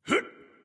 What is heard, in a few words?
grunts; smite; voicepack; hercules